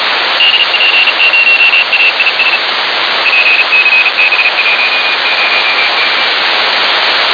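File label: AISSAT 2, satellite; received the CW signal of AISSAT-2 on June 30th 2014 at 09:51 UTC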